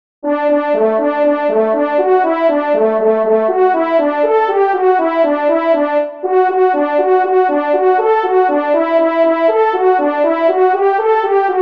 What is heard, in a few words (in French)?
Sortie du Chenil Solo, trompes; Fanfares imposables en Concours